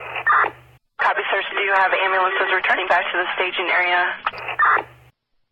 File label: wounded; Ready Or Not